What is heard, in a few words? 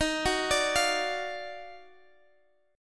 D sharp Minor Major 9th D sharp down Guitar Drop D